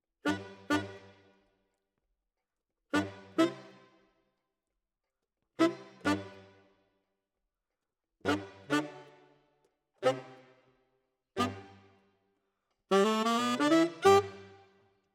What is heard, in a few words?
Tenor, RNR for piano